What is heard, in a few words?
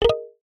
multimedia ui tone short plucked nylon